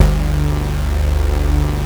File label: Prophet 5 D2; Hollywood Edge - Giorgio Moroder Rare Synthesizer Collection